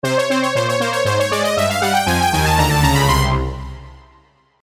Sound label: Lead; Mysteries; Spire